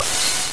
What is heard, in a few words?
New Email; Metroid